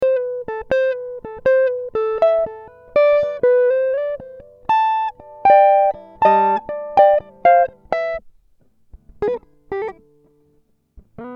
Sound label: Am60 GTR impro